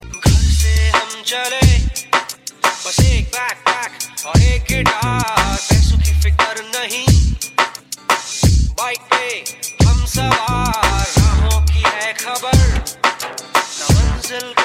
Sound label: Rodies dhol Mix